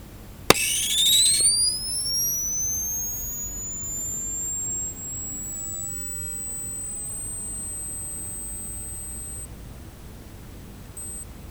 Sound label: flash charge